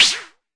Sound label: Arturia MiniBrute sn